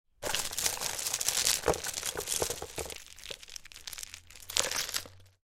Jewelry clacking and rattling

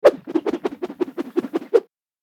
Boomerang flying whoosh

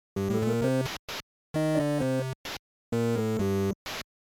Retro 16 bit or 8 bit game over tune

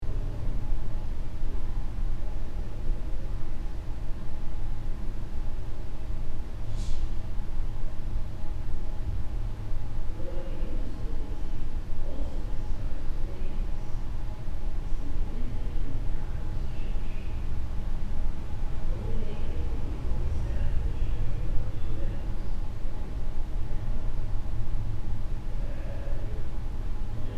Apartment room tone interior perspective

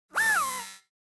Sci-fi robotic servo motor small

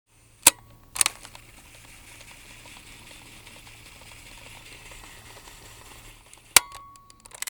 Cassette tape player fast forward or rewind

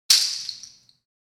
Goatnail shaker single

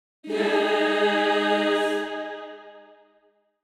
Angelic choir angels video game yes

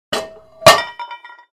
Loading the barbell 50 pound weight plate

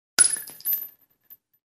Bullets shell casings

